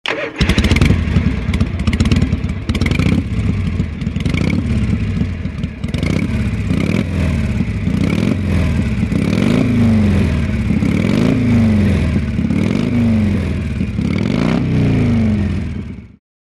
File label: Motorcycle Start